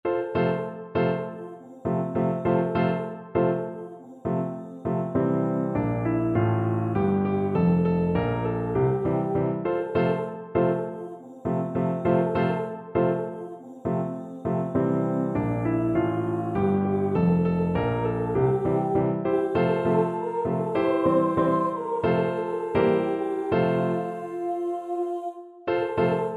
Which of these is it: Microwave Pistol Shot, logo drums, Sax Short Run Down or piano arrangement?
piano arrangement